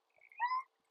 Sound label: Chirp